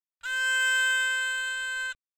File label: haegeum